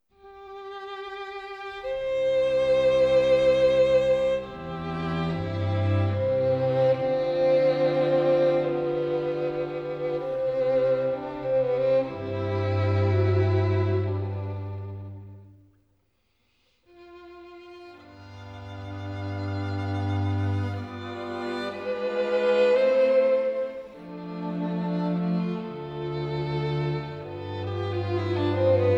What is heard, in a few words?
String Quartet in G Major Adagio cantabile; String Quartet No. 2; Op. 18 No. 2; Ludwig van Beethoven; Beethoven - String Quartets Nos. 1-16